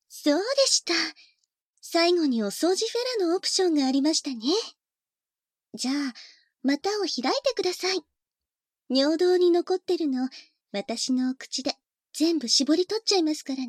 お掃除フェラ（バイノーラルマイク収録）; バイノーラルマイク収録 効果音あり

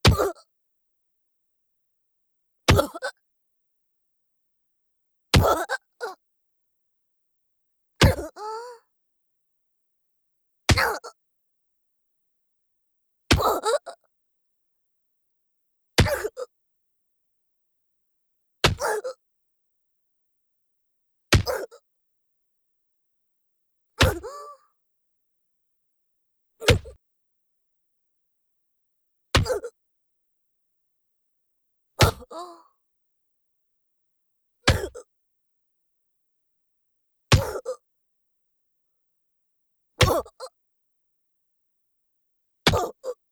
腹アッパー BPM90; 効果音あり

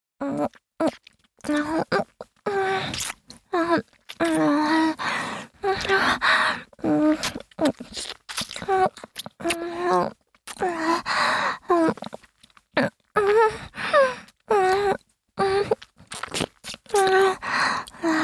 キス(中):舌先を絡めるキス

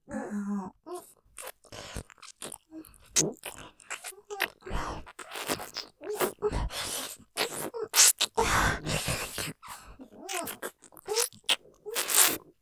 キス, ぺろちゅぅ, ねっとり感マシマシ; フェラみたく; ステレオ

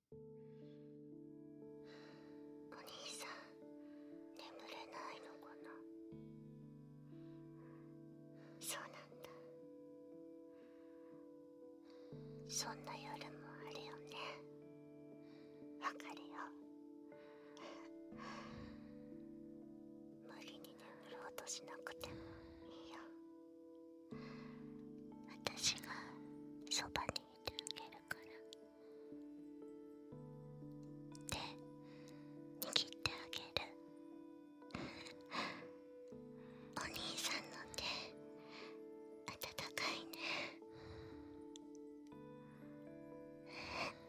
お狐ちゃんの添い寝 寝息入り BGM入り

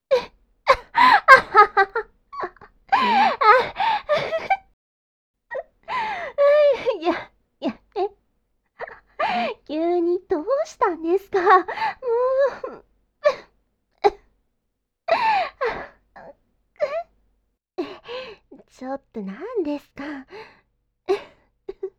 罰ゲームでひたすらくすぐられる女の子の音声

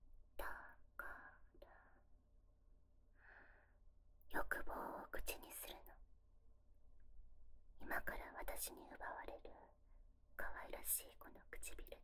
絡み合うディープキス

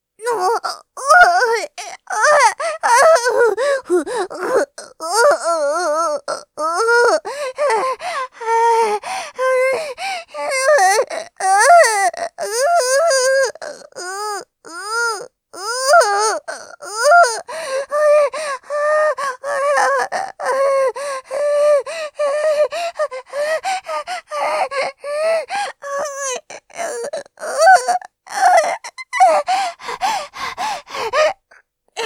喘ぎ+んほぉぉぉ; ジト目で爆乳JKうさぎっこ 首輪ちゃんのわけありや 初接客; 喘ぎスパイス！; 快楽堕ち

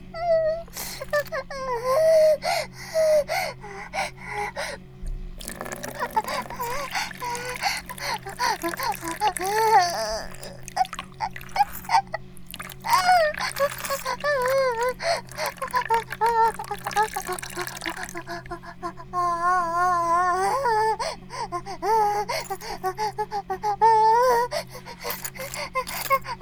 バイブ放置; イクたびにわけて

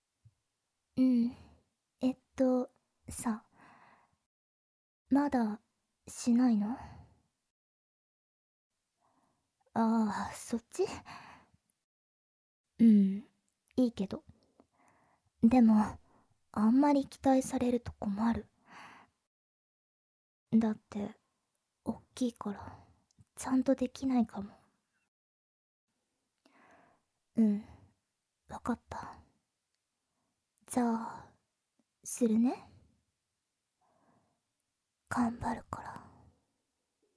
フェラチオ 心の声なし; クーデレ彼女のエッチな気持ち

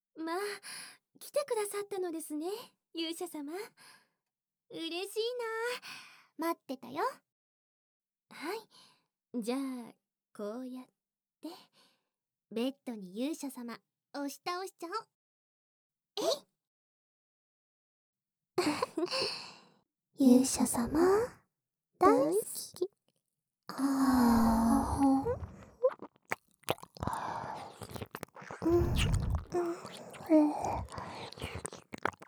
姉妹のW耳舐め、乳首責め, ASMR

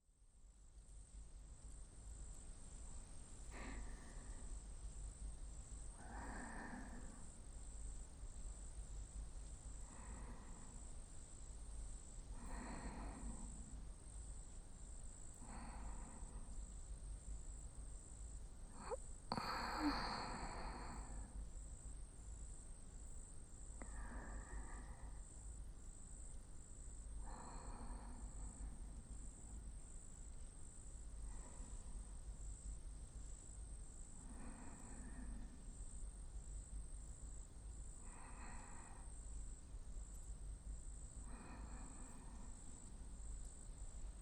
寝息（左側）

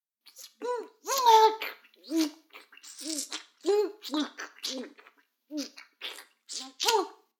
フェラ音声; 逆時間停止されて

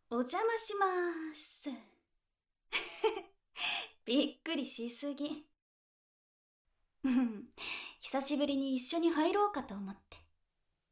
シャンプー, ASMR; 効果音なし

